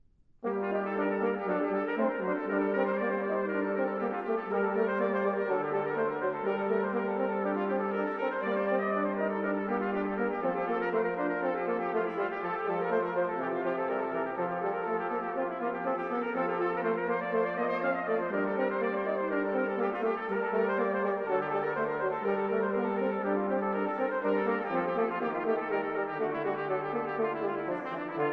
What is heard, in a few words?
Brass Quintet, Baroque Brass, Passamezzo Variations; V. Varitio Violistica